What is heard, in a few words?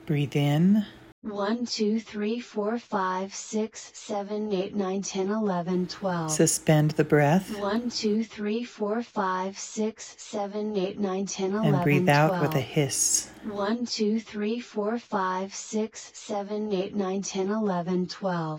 breath extension